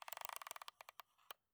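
Camera, aperture ring, click, movement, open, close; Point and Shoot FUJI Cam; MKH8060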